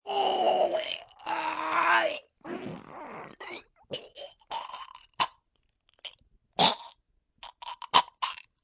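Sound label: Choke Gagging male, Choking, male, gagging, gasping; gasping(#1)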